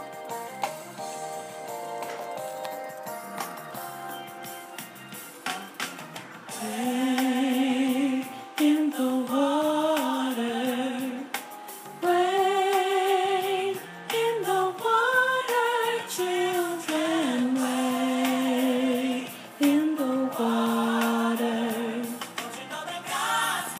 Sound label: Wade In The Water